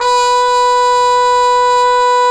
BRS CPM TP, Cup Mute Dry; Roland L-CDX-03 Disk 2; Cup Mute Tpt

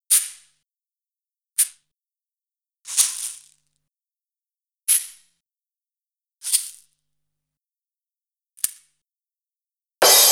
shaker; Zero-G; Total Drum Bass

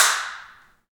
CLP HNDCL, Handclaps; Roland LCDP14 Africa VOL-2; Afr. Hands

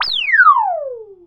Disco Shots; Zero-G; Soundclash Nu Dance Electro; Acidized Wavs; FX Atmospheres

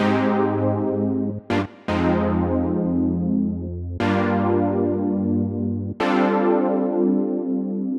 Chord Synth, 120bpm; Zero-G; Nu Disco; Nicengroovey C

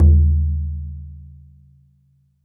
BODHRAN, Celtic Percussion

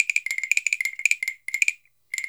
percussion loops 120; Sampleheads; NYC Percussionworks; 90 s Sample CDs

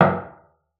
CELLO FINGER; 90 s Sample CDs; Xsample VOL-5

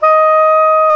SAX SOP MP D, Soprano Sh; Roland LCDP07 Super Sax

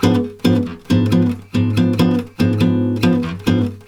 G# DIM GTR, Brazil Bossa; USB Soundscan; AKAI

